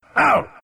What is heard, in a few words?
pain; dazzle